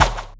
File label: sling; allods2d